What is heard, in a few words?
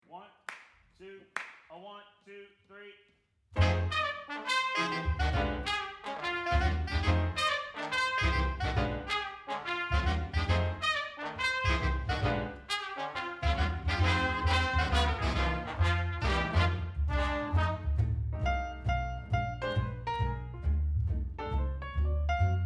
Drum Track